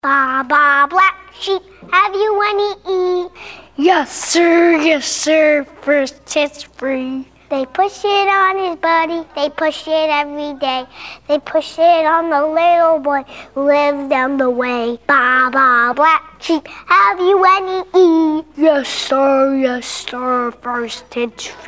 baa baa black sheep

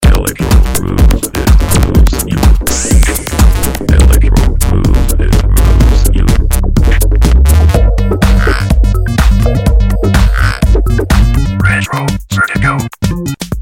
electronica

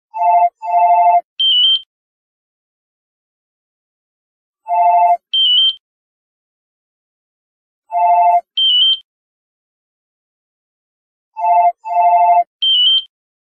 classic office phone

